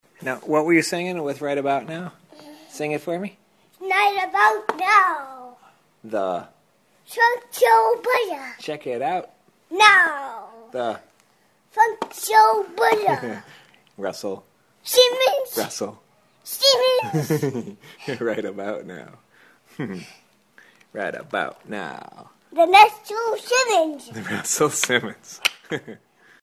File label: funk soul